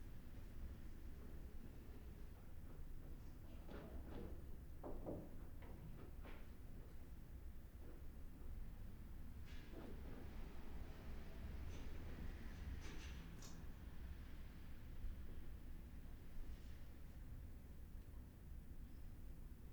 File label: Room Tone Bedroom